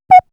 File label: Shot; 90 sSampleCDs; Coldcut's Kleptomania VOL1